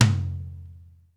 ROOM T, ROOM KITS; Crypton; DrumBase5000

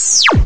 SCREAMIN; E-MU Formula 4000 Series Vol. 2

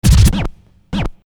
Scratch, Hip-Hop; Construction Kit; Sonic Foundry (Sony Creative Software)

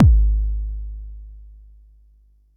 Bassdrum, Jomox Xbase09

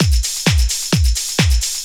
dr t 130 trance 2; LOOP TRANCE; DRUMLOOPS

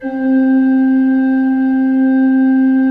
C3, Cathedral Organ, ROHRFLOETE; Propeller Island

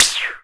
PSSEW, VOICE; Rarefaction - Poke in The Ear With A Sharp Stick

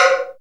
Perc FX, Percussion; Best Service ProSamples vol.37 - Dance Synths

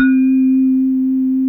Bar C4, Fairlight CMI IIe, Mallets

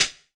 HH, Drum Mashines; EdgeSounds

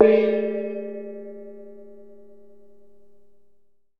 A1 HARD, Kye Waing

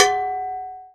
MAMBO BELLAC

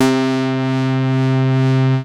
C3, BASS; Q Up Arts; Dream Experience; BASS BANK 16

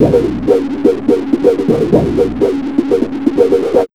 NYC 125 PHAS, Big Beat Drumloops; USB Soundscan vol.25; AKAI

